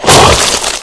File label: bullsquid attack; hl2